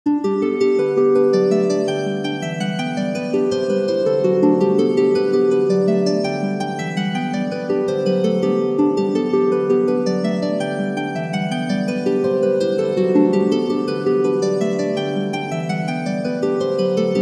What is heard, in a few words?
jig; sam